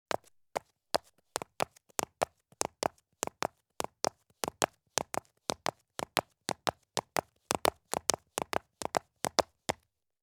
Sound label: Women's Heavy High Heel Shoes Descend Quickly with Gallop Down Concrete Stairs; Sound Ideas; Footstep Sound Effects